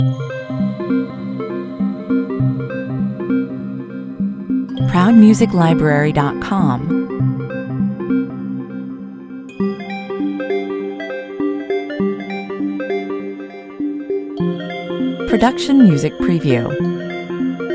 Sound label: explainer 100bpm; talkover